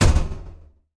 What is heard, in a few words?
failed spell; Decursive